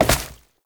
Step Vegetation, Foley; Warframe; Oraxia

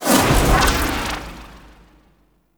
UI Popup In; Kuva Lich; Corpus Nemesis

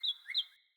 Cardinal Male Bird Double Chirp

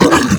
Flying Mouth Pain; Enemies; Warframe; ManInTheWall

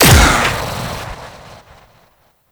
Shot, Infested Dart Pistol; Coda Tysis; Weapons

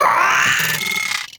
Amalgam Moa Vocal Attack

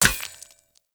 Short Sword Throw Medium Impact Rock

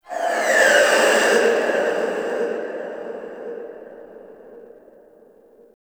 Stadium Infested Medium

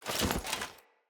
Grunt Foley Roll; Enemy; Sinecure; Melee Grunt

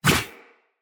Sphere Dual Sword Whoosh; Weapons; Player; Swords

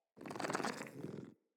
Kitten Growly Purr; Enemies; Catbrow

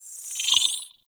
Hologram Start; Tenno Hub; Railjack

